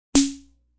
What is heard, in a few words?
Snare Single; Rollover or button sound; Musical